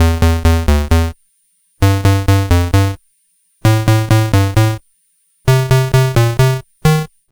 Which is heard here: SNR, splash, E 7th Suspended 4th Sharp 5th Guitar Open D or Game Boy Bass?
Game Boy Bass